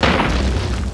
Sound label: Blast Poison